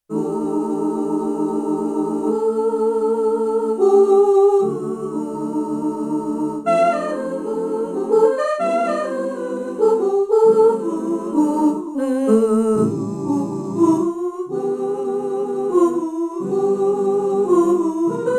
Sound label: Pops Voice Ooo; Yamaha TX16W